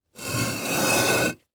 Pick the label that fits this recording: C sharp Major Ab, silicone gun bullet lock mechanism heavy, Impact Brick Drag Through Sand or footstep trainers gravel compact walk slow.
Impact Brick Drag Through Sand